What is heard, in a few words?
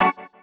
Key organ; Luxembourg Zwee